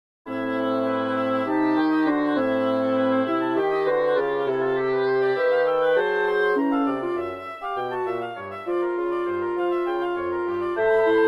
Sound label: Woodwind Quintet